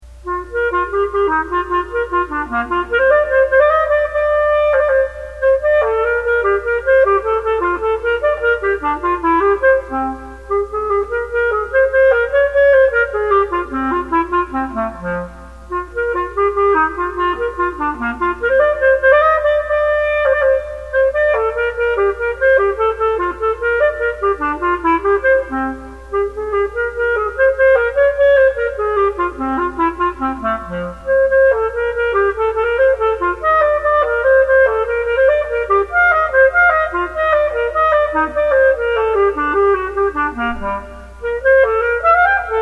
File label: Gigue from Suite No1 G major for solo clarinet; BWV 1007; Johann Sebastian Bach